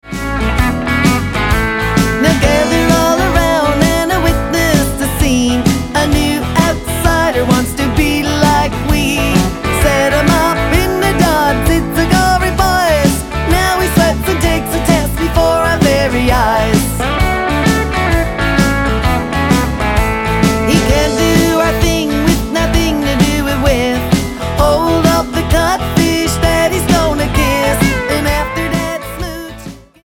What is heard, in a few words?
Screech in Song